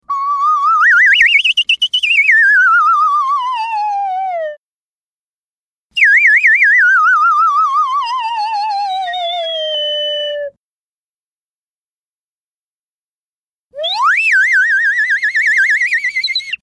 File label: comical vibrato